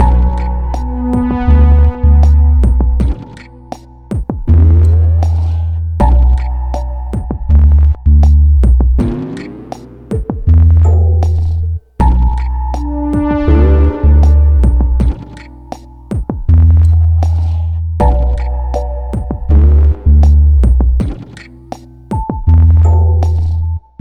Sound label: stem outro; Pix the Cat